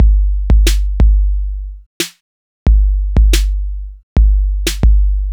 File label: Beat, Hip-Hop